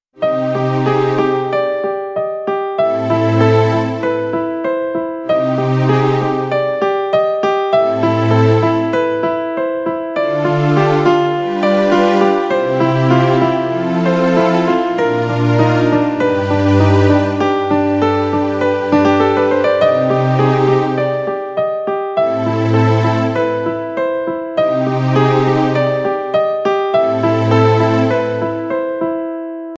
theme piano cover; mouna raagam

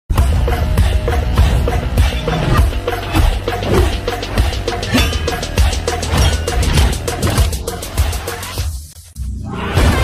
bgm ringtone telugu bgm lofi; haromhara; sudeer babu

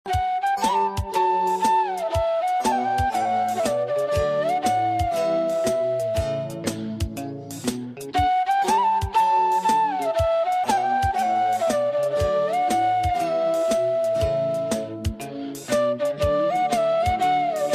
bgm ringtone flute ringtone music

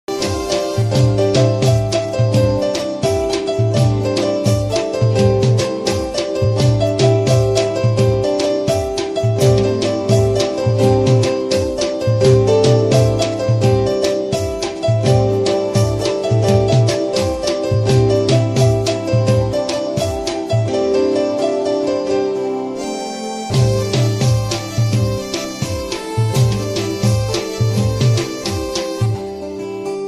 gujarati ringtone gujarati song

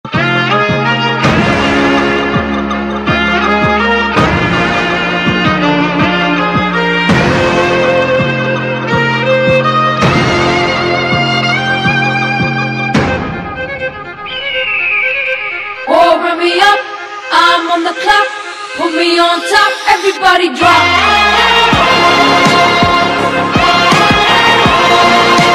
theme music ringtone bgm; prabhas; kalki

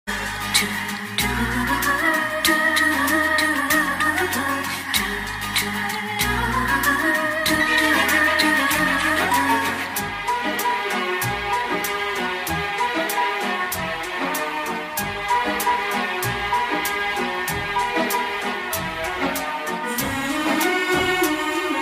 love bgm; karthik

